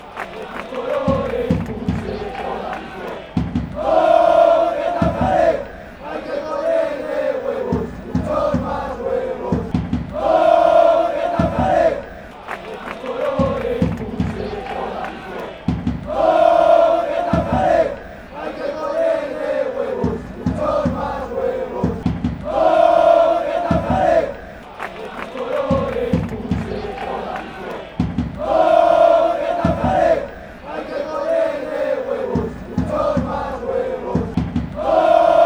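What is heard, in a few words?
fanchants, football chants; en tus colores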